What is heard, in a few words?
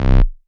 Basses Root C